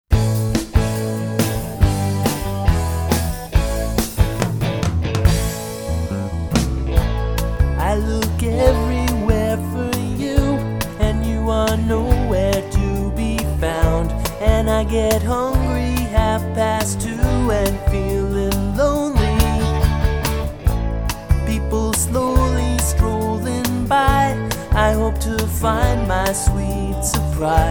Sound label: Pop Rock